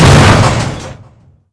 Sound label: CRASH